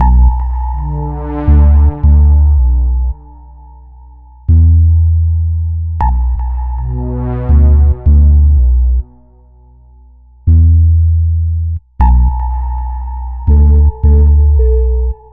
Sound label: stem outro; Pix the Cat